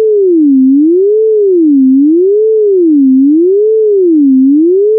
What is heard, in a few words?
physical sine varying note bend